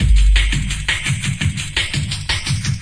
170 filter break; drum loops and breaks; 161 to 180 bpm

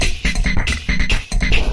synth; MELODIC SAMPLES; SYNTH AND ELECTRONIC

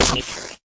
fx drum, Electro FX

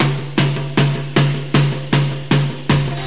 156, drum loops and breaks; 141 to 160 bpm

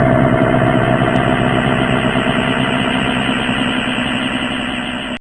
synthetic lions den; Various Strange Sounds and Effects

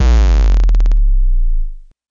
8bit sub fart; Basses

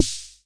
rhma sd, Whippany Rhythm Master; drum machines; 1970s

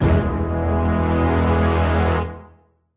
orchestra hit melody kit

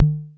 tabla, Korg ER-1

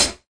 hat; Hats

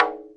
arabic perc, Arabic Percussion Kit

